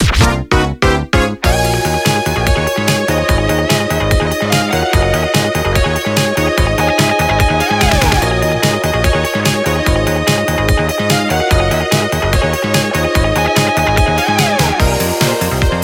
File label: results PERFECT; fridaynightfunkin